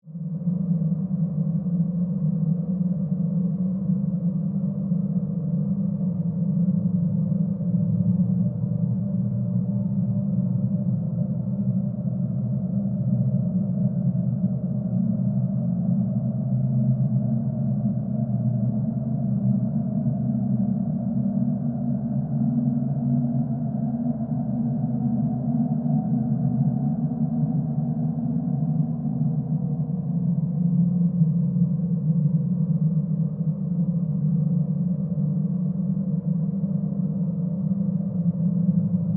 Alien Cave Wind, Scifi, Alien, Cave, Wind, Drone, Ambience; Aliens; BlastWave